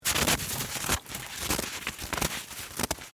Snowball Make, Weather, Snowball, Make, In, Hand, Long; BlastWave